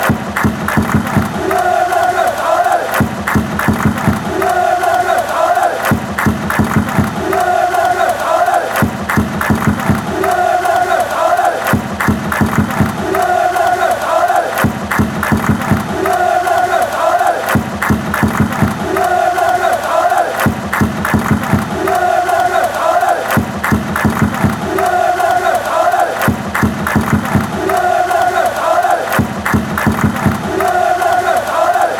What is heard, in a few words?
allez, fan chants; braga